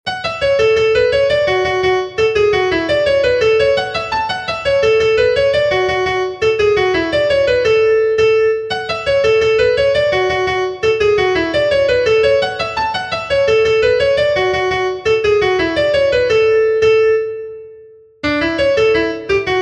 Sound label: Jig, fiddlers